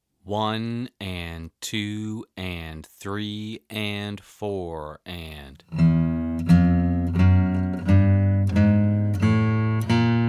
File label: Chromatic Scale